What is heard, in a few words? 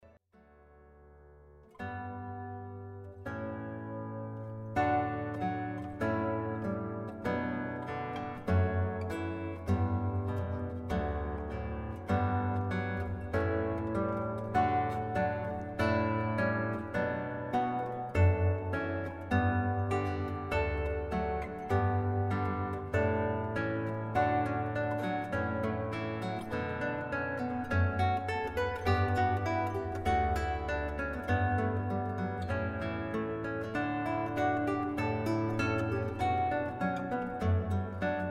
Canon In D, classical guitar